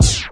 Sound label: prophet Stop; Prophet with Processing; Hip Hop; style kits